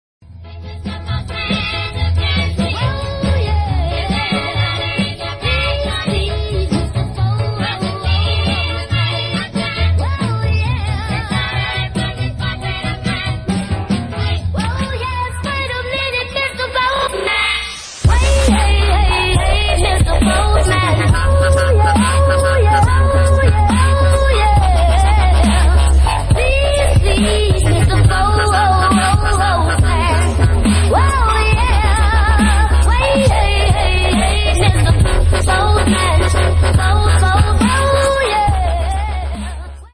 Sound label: dubstep refix